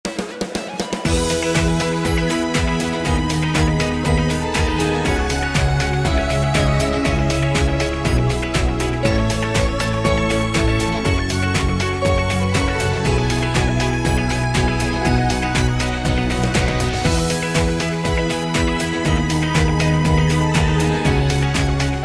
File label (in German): Klingelton